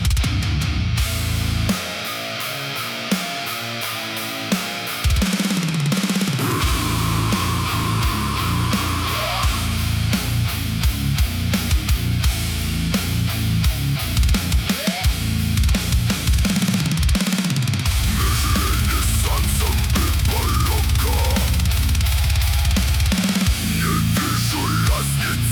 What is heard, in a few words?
death metal